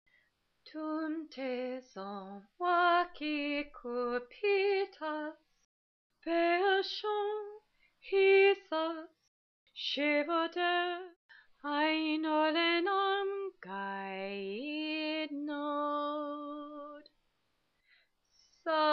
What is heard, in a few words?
lullabye solo; carpathian chanting